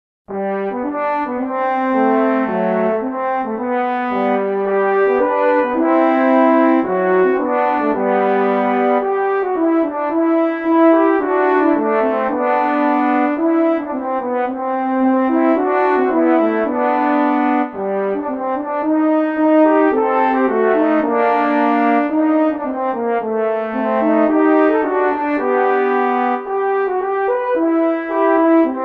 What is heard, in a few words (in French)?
petit duo en re pour cors